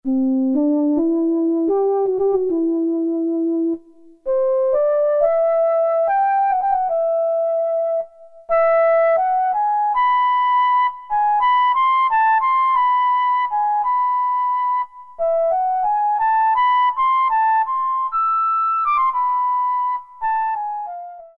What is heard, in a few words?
Flute; Factory A